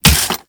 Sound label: Ghoul Crutch Step Dirt, Footsteps